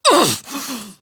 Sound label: Pain Female; Warframe; Tau; Prime Grineer Female; Battle